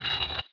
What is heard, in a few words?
chess successful movement, phonetones Nokia N900